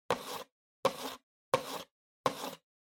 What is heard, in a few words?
PC Mouse Move Wood Hit